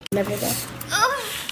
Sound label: moaning